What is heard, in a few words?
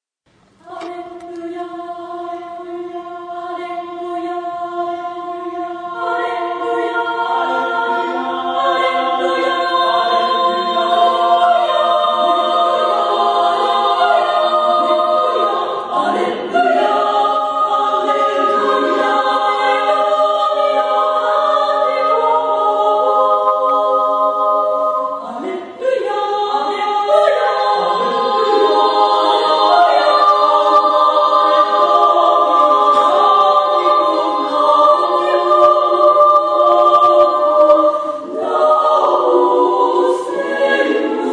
Alleluia Cantate Domino, chorus; Matsushita